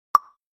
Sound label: Email, Nokia alert tones; Asha 303